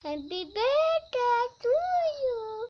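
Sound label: Happy Birthday To You Little Girls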